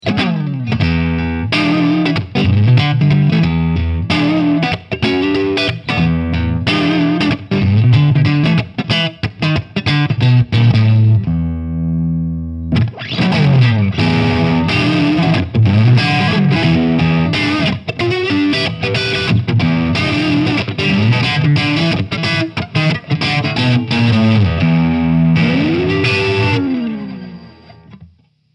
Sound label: Plexitone Single Coil Neck; Carl Martin